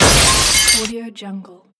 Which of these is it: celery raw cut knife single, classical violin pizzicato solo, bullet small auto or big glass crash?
big glass crash